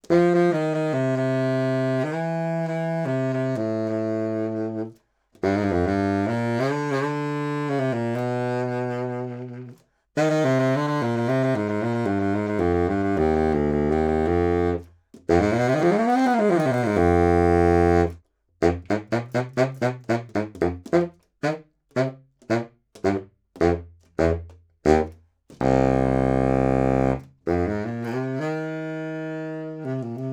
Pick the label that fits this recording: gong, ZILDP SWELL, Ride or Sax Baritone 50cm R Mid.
Sax Baritone 50cm R Mid